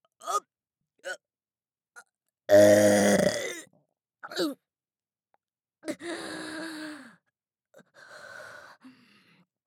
ザーメン ゲップ（特大）; ザーメンゲップ集

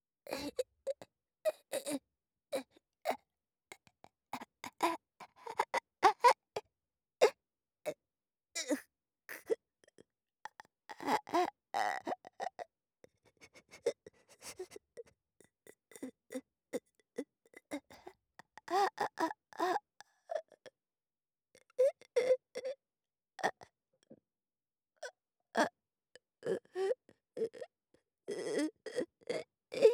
メイド苦しみ… 瀕死

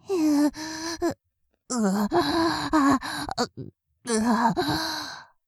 弱った痙攣; 失神中

